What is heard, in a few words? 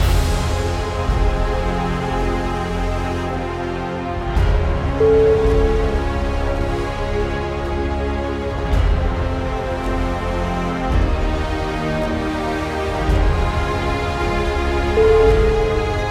epical dramatic story